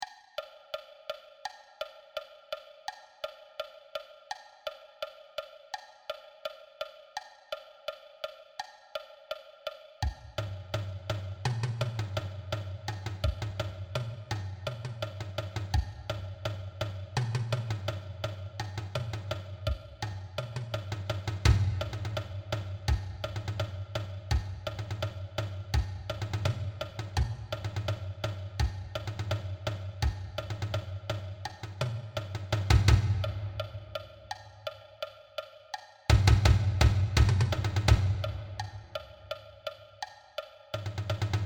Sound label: Marching Bass Drum